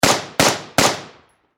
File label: Warfare Machine Gun 5.6 Caliber M4A2 Triple Shot Distant Perspective